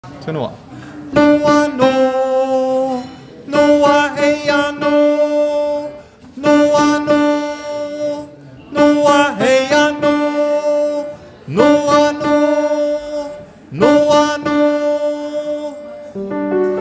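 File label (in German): Tenor Takt Durchlauf, gospel choir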